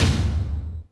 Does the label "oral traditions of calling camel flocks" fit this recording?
No